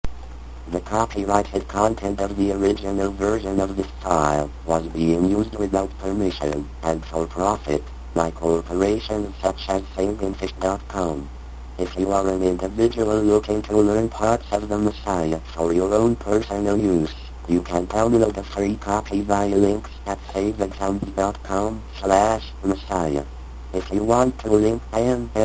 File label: Alto